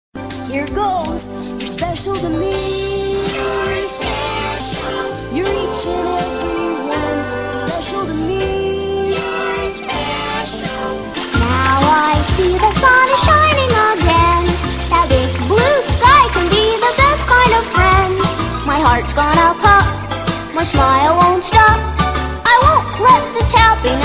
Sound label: women singing